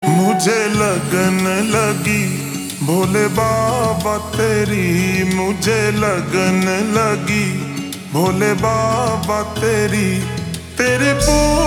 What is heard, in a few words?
Slow Reverb Version Ringtone